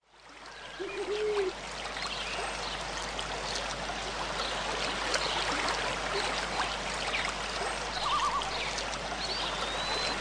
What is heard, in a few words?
Tranquil Waterfall